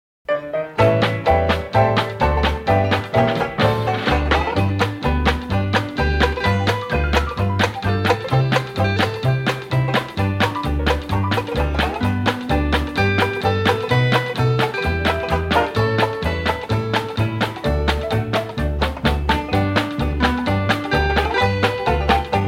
mixer, instrumental

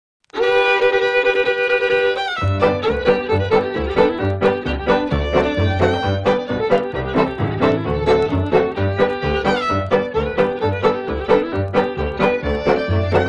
cross the mountain (instrumental)

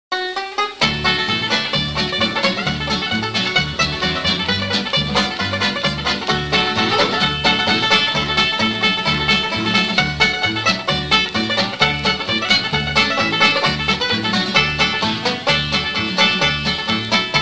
instrumental

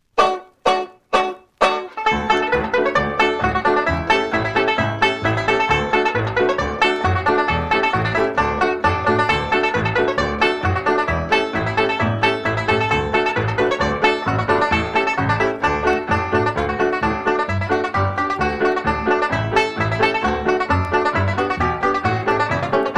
instrumental

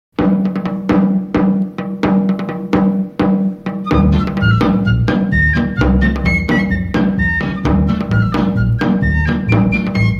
instrumental

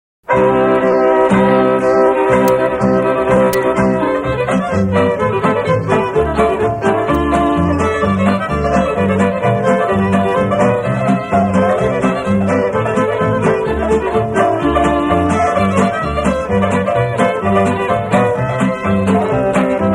square dance reel instrumental; riley's reel

